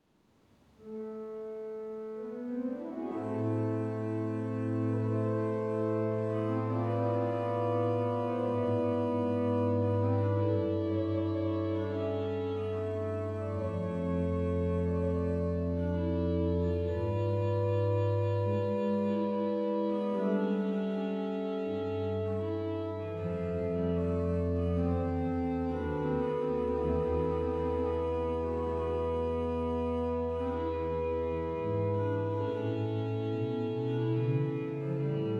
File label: open 3 krumhorn cromorne en taille, organ; Cromorne en taille The difference between a K and a C! Starts on the German Choir Krummhor; Cantus played on Pedal - both 8' reeds and 4' Clairon; It ain't Couperin, but it's close!